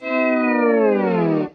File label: Loser Sting; SpongeBob Marbles and Slides; Android